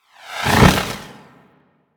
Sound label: Idle Wing Flap; Dragon Pelt; Warframe